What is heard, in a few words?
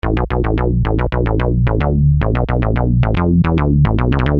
Syn-Bass m Bm, Techno Synth Loops, 110bpm; Sonic Foundry (Sony Creative Software); Signals I